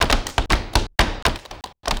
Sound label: 120 clap